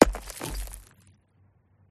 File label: impact brick rock dirt gravel single hit; PMSFX